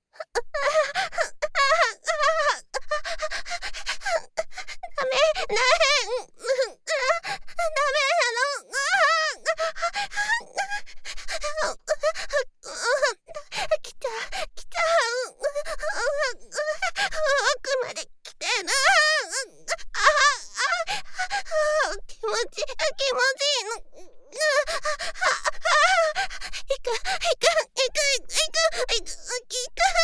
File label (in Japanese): 絶頂（SEなし）高潮